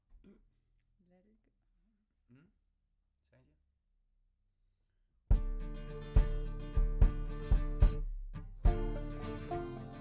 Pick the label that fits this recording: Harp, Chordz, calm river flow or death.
Chordz